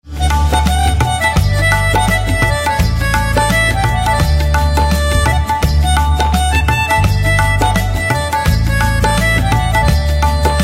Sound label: Harmonica